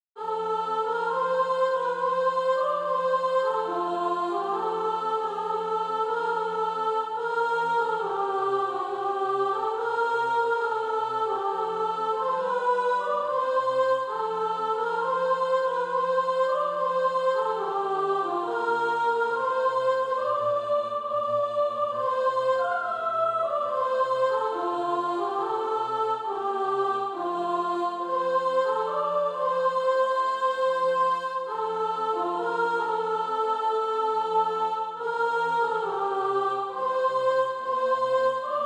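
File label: soprano, SATB Practise Music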